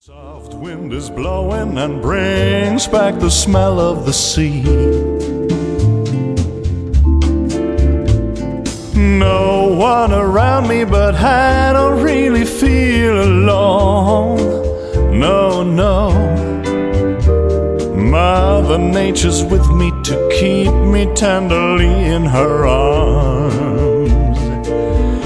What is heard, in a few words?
bright lovely summerday bossa